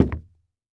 Foley Footstep Golf Cleats Single Step On Solid Wood Platform; Foley Footsteps